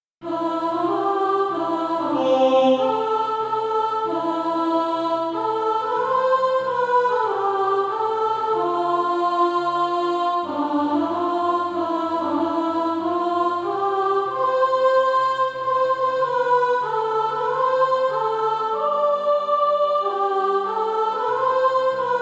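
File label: soprano; Faith Brings the Victory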